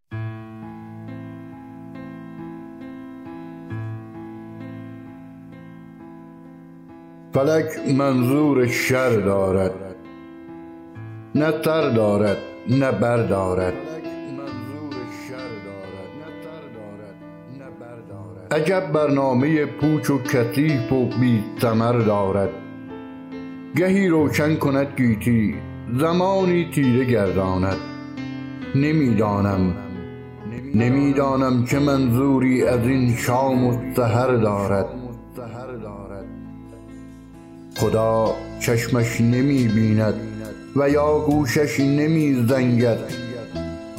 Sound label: Vocal